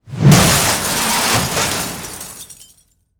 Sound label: metal sign break; anemoi